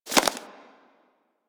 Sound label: foley idle ear flap; Warframe; Catbrow